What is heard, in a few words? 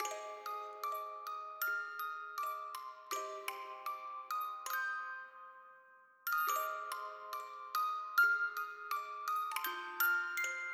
Pick And Click; netent